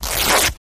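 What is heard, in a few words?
Cloth Rip Fast Low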